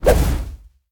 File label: recall kick fire; Smite; Susano